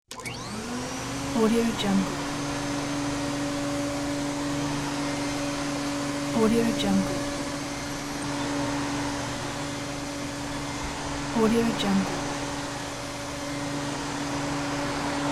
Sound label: vacuum cleaner